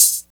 natural open high hat sound c sharp key, Royality free open hi hat sample tuned to the C# note